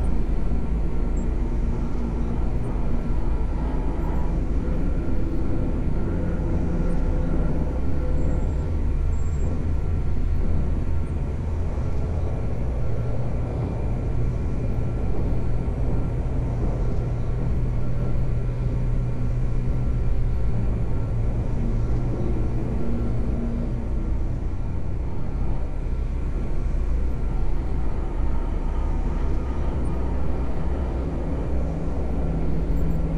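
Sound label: ambience ship room reveal; Titanfall 2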